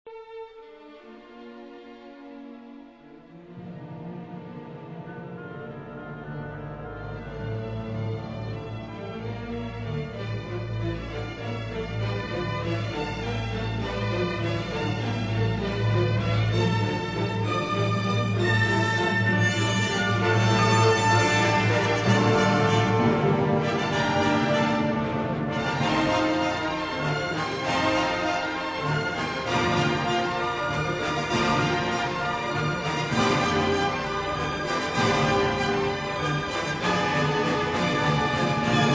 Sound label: dvorak symphony 7